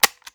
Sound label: Button